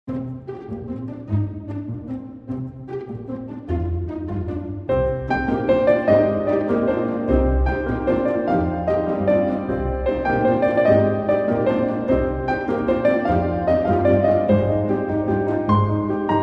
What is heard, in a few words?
Romantic Adventure